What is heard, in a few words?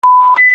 warning signal; Navitel; Ira